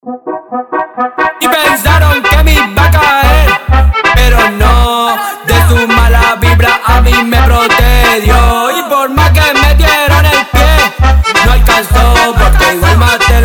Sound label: funk do bounce speed up